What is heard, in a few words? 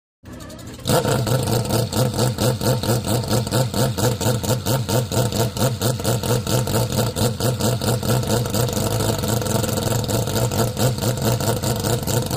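Sports Car Start Away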